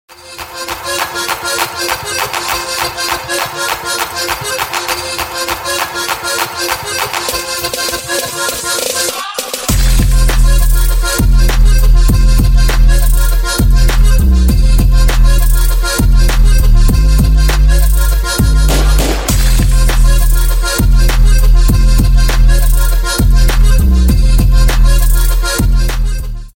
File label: trap lezginka remix